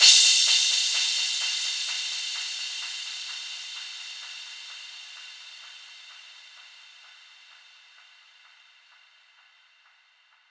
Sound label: FX Downlifter